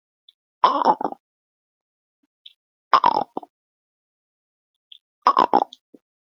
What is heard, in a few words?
Human sounds Digestive Stomach rumble, vocal imitation